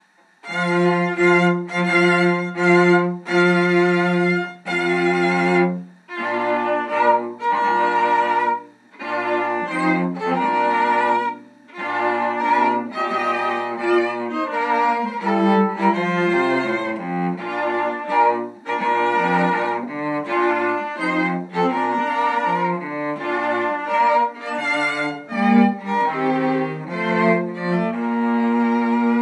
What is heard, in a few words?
TRIO; Bridal Chorus